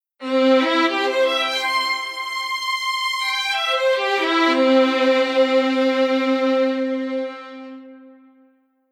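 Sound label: Strings non legato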